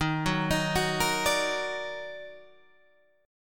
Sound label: Eb Minor Major 7th Eb down Guitar Open G, chord